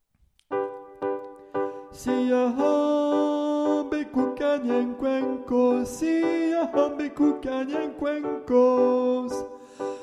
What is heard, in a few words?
tenor; siyahamba (tenor )